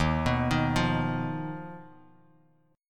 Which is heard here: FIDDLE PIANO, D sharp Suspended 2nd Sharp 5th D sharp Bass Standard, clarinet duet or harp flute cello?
D sharp Suspended 2nd Sharp 5th D sharp Bass Standard